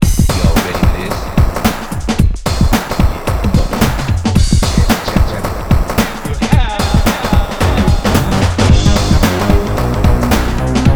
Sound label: Instrumental; Jazz Jackrabbit & Knuckles